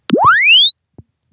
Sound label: press one finger Chirp; Samsung; zhengkun